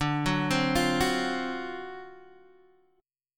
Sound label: D 7th Sharp 9th D down Guitar DADGAD